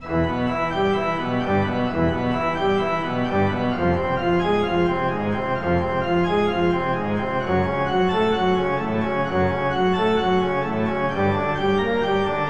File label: Piano; Zzzyt Mobile